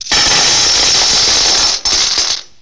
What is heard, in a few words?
Jackpot Coins Flowin, coins